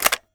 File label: m4a1 silencer bolt forward; weapons; Counter Strike Model Pack; CS:GO